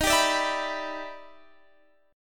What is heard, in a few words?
E Minor 7th D sharp; strummed